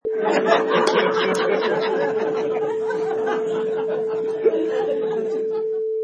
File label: Crowd Laughs